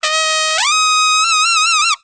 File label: Joy, happymusic; 特酷声音素材库; 背景音乐